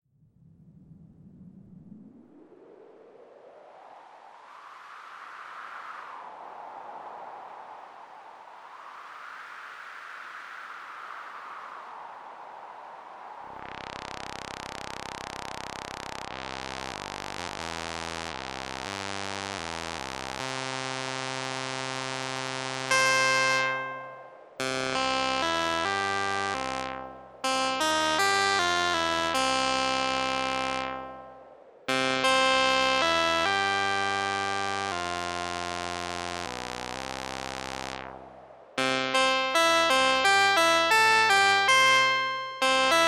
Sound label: korg sigma